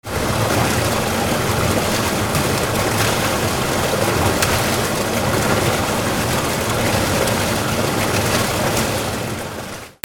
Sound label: WATER